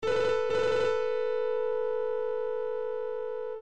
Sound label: ring o ring